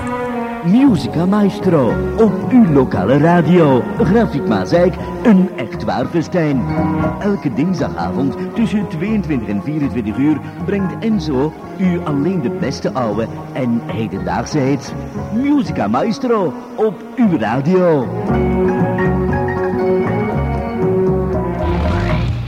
Jingle; Musica Maestro; Gebruikt voor het radioprogramma Musica Maestro; Allerhande

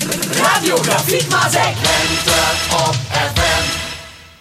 Jingle lente; Seizoenen

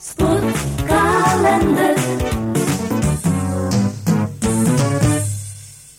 Jingle zang; Sportkalender

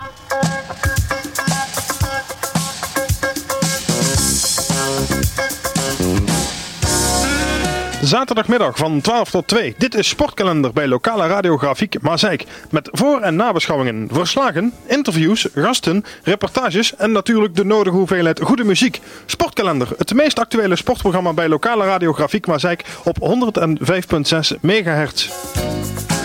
Jingle zaterdag; Sportkalender

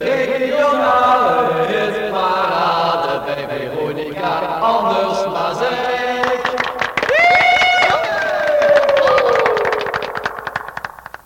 gezongen jingle regionale hitparade

